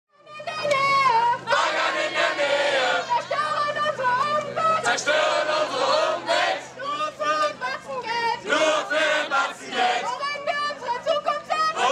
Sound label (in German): Protestieren, soundscapes